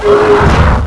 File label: pain; Alien Arena; nemesis